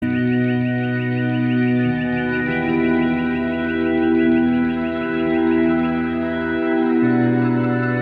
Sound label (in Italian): verso uccelli; prime permutazioni